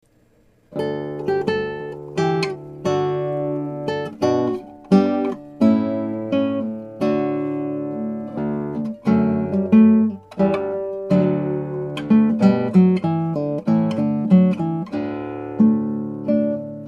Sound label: galliarda lute